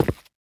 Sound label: tile, footsteps